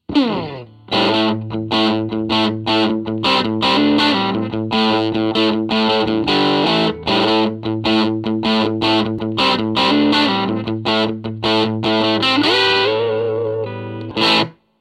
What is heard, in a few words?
Fender Clean